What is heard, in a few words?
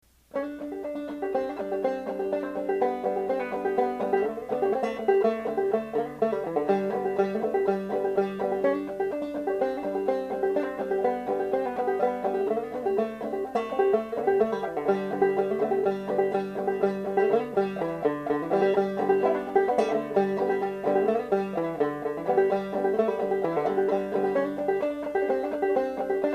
Banjo Solo Clawhammer